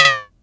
SYNCUSSION

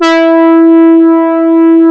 C4, SYN ANA5; Best Service Gigapack 1

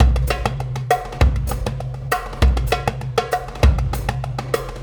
Tactile Impulse, Processed Drumkits; Sonic Foundry; Zero Gravity Beats

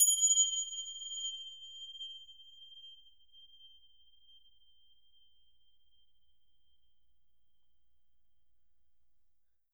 fgrbell, BELLS; Roland MV8800

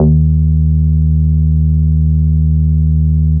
HOT D#2, BASSES; Best Service Alpha Dance vol.2

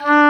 OBOE, Orchestral Winds, Dry; Roland LCDP04; Oboe 4-8

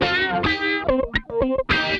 RAW, Funk Guitar; 90 s Sample CDs; Zero G

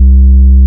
SUBSONIC C2, BASSES; Best Service Alpha Dance vol.2

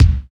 DMA XD-5, DIGITAL

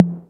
Misc Tom; Mo' Phatt; Digital Sound Factory Mo' Phatt

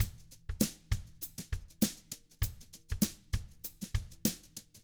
FUNKY, Drums

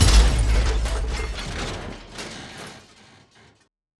Crash, cartoon Foley Fx; musicradar; cartoon caper

